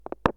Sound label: Plastic Bottle Gring